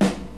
dark sounding snare single hit g sharp key; snare drum samples

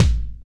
rich high end kick single hit a key; Duration 0:01; kick drum samples

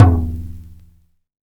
mid tom drum single hit g sharp

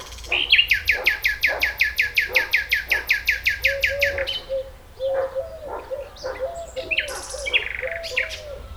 blackbird and cushat with dog in the background; soundscapes

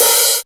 open high hat sound d key; Duration 0:01; Samplerate 44100 Hz; Bitdepth 24; Channels Mono

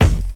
00s smooth kick drum single shot f key

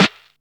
clear acoustic snare g key

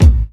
smooth kickdrum D# key; D sharp key; one shot

